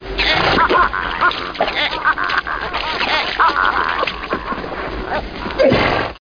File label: zebra